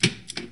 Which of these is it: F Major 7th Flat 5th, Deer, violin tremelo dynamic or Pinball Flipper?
Pinball Flipper